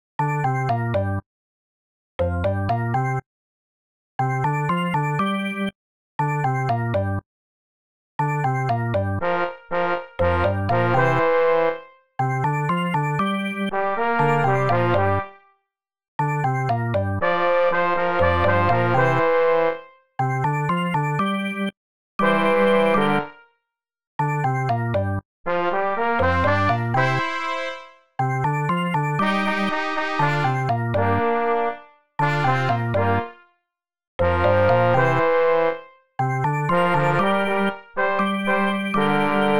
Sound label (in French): melodie